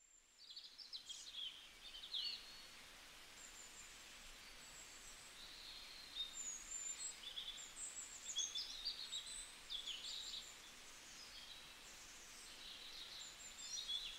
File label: chirps shortened